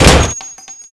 NEGEV

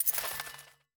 Feather

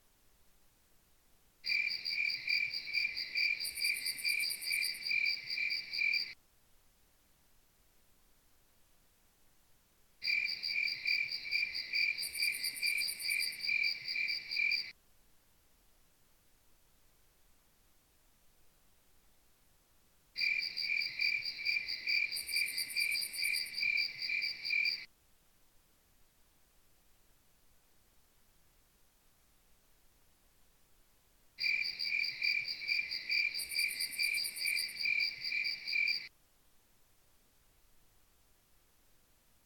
Ambience Fall Night

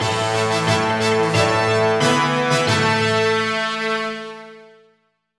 Music Sting - All Quests Bonus

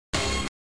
pickup tone

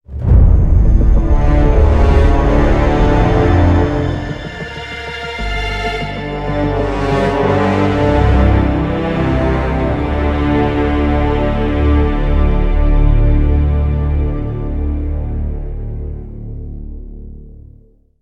defeat

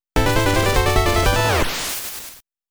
GAME OVER (GAME OVER BGM); Falsion

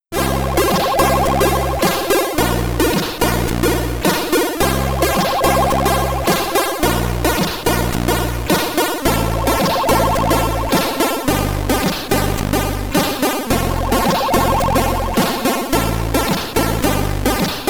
Name Entry, SID Stereo; Maze Mania; commodore 64

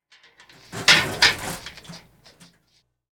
Milk cans drop; The 39 Steps

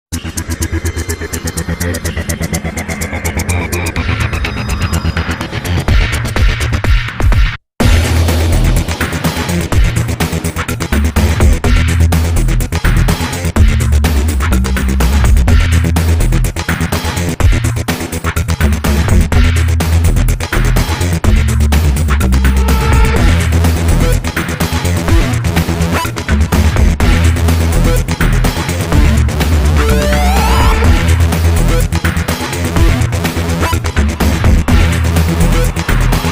race; Ferrari GT Evolution

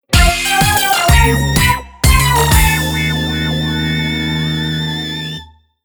Fail; super bomberman r

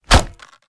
Bone Collision; Angry Birds

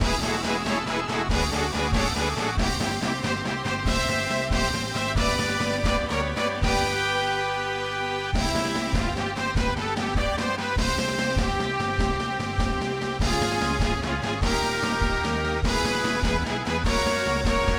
Trophy Win; mario tennis; n64; Doubles

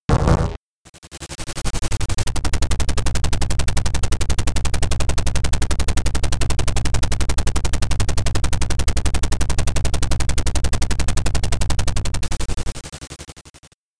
Helicopter Appearance; P.O.W Prisoners of War; arcade

